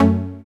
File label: techno Db3; Jack Sparrow Deep Dubstep Progressions